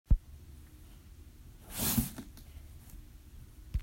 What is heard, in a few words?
Foot Skid On Wood